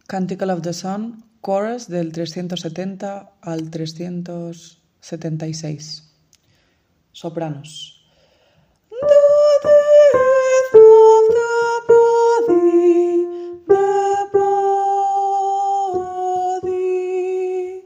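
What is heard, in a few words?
soprano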